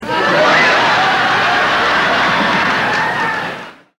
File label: laugh